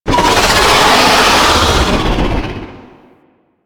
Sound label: Baby Dragon Vocal Death; Enemies; Warframe; Duviri; Void Dragon